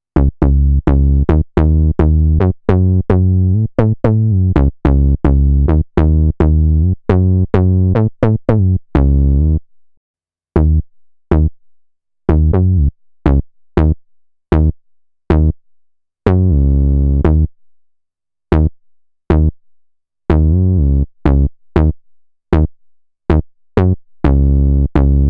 AKAI MPC LIVE II Bassline

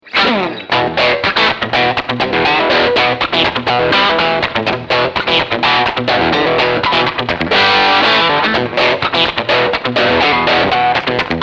Bridge Crunch

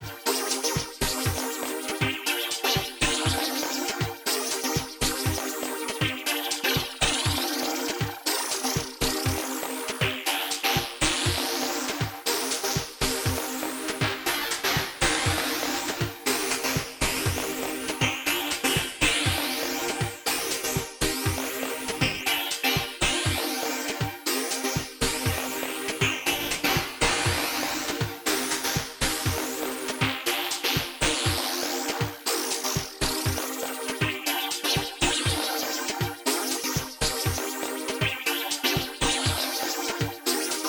Linnaeus Filter ext F MOD FM different polarity; Rossum Electro Music

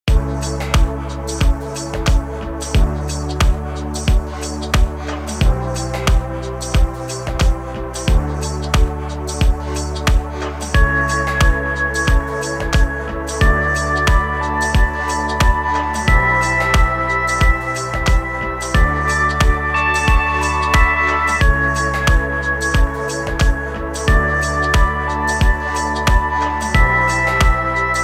Harmonic Waves

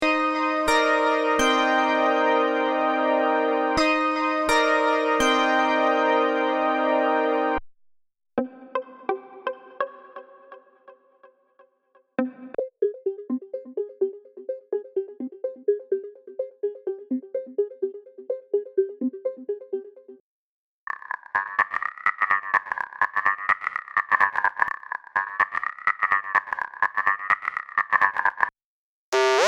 Melodic Loops

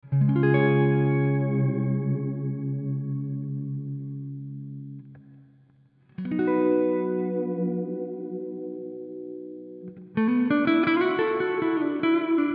Guitar Concert Bright

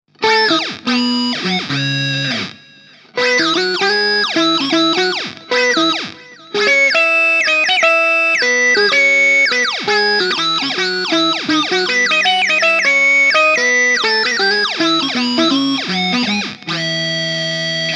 mix git synth

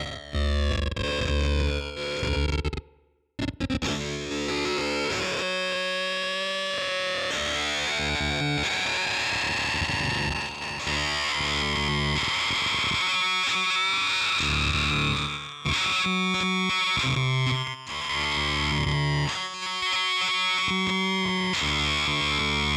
Industrialectric 4046 m Arpeggiator

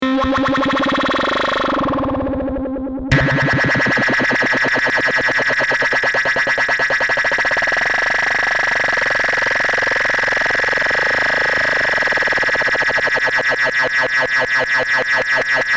ms20 mini LFO test

